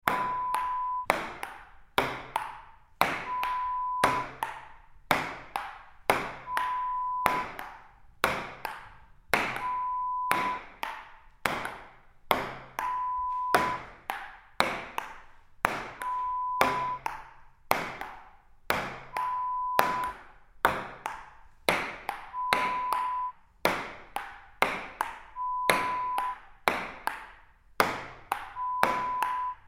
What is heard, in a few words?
Ping Pong Slow